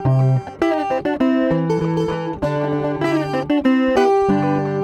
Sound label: Ac 12 Str Gtr 099bpm C, Twelve String Guitar, African Guitars; Quazibeat; African Secret